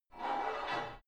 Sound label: take off light weight barbell soft reverby, fitnessstudio; sport freizeit